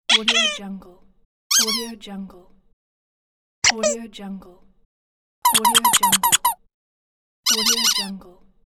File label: toy squeaks